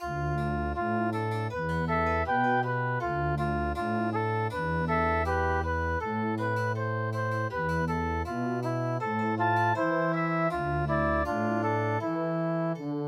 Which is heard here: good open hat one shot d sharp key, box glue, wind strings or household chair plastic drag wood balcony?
wind strings